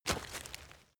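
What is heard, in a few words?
step leaves wet heavy, footsteps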